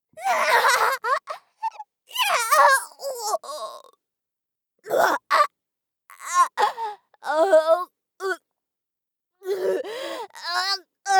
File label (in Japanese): お腹を喰われる; 効果音なし